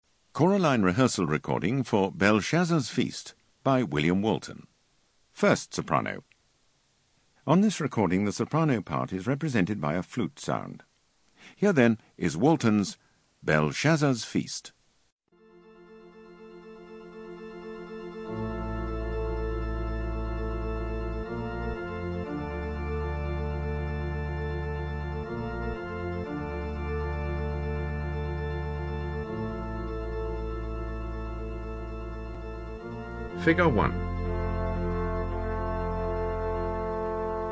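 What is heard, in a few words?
Soprano; Walton Belshazzars Feats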